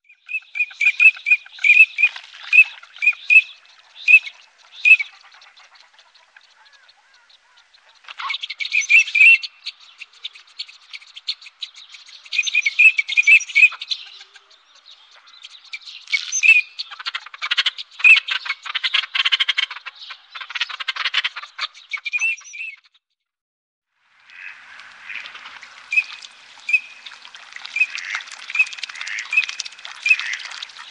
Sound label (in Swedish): Kricka, Faglar